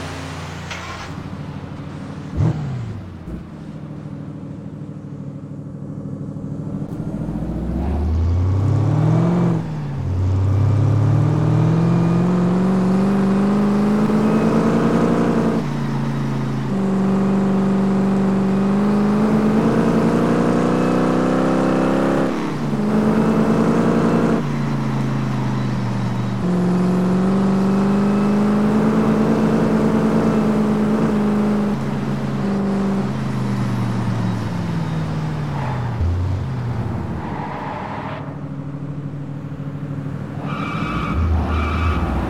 ford mustang; 1965; Ford Mustang 289; Ford [USA]

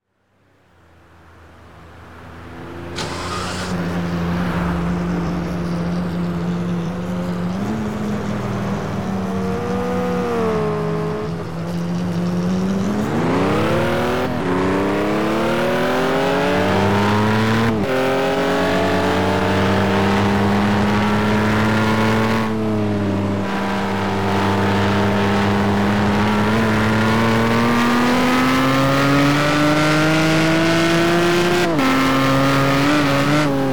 ferrari 575m

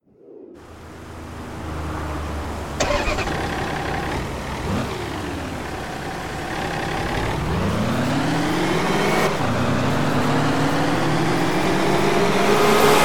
bmw 530d

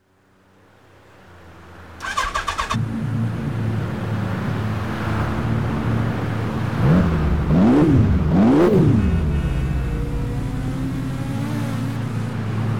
ferrari f50; TDU-1

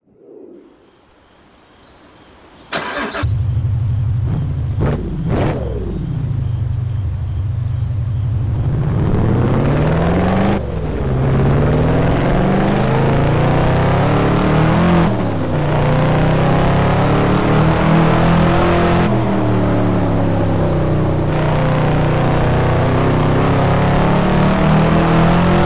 chevrolet corvette c7; Chevrolet Corvette Grand Sport [C6]; Chevrolet Corvette ZR1 [C6]; TDU-1

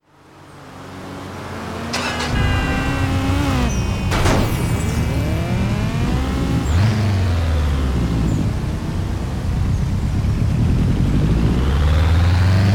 nissan gt-r nismo 2015; TDU-1

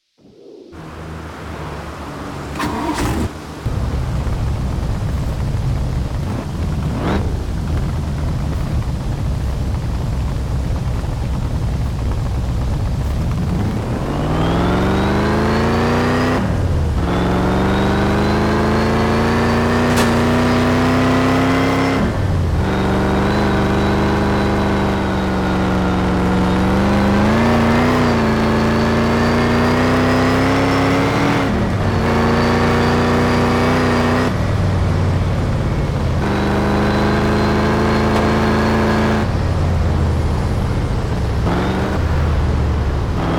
shelby cobra 427 sc; 1965; TDU-1

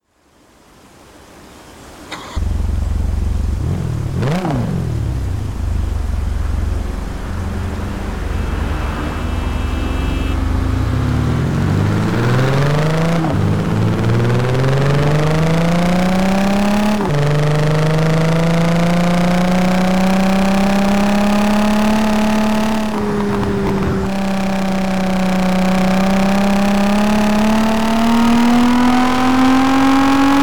audi s4 backfire; Audi S4 [B5] [NA / Backfire]; 2001; TDU

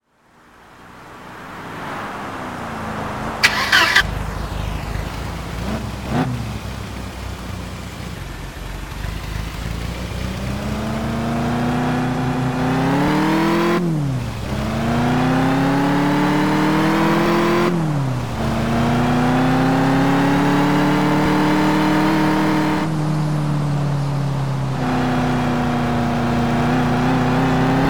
mercedes-benz 190 e 2.6-16 w201; 1988; TDU-1

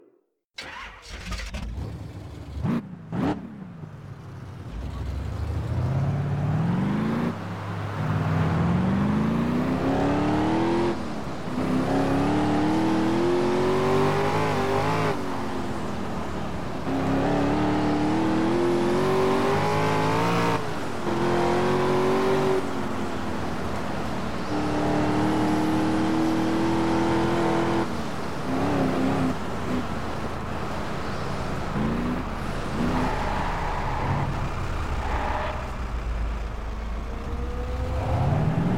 ford shelby cobra concept; TDU-1

